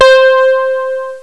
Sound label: electric piano; Echo 1.1